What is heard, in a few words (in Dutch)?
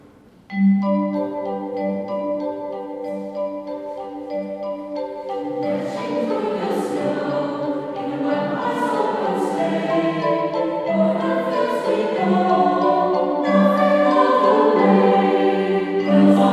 Jingle Bells, Koor